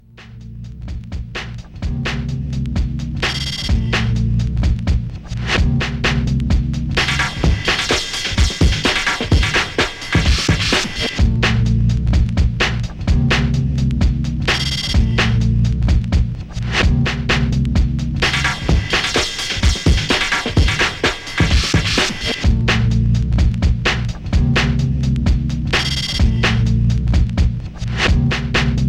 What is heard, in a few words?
menu song; harmonix; frequency ost (rip)